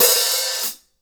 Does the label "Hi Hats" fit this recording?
Yes